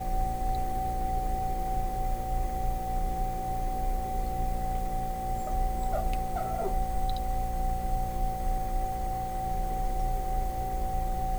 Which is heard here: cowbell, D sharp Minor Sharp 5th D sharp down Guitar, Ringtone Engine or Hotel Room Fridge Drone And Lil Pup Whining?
Hotel Room Fridge Drone And Lil Pup Whining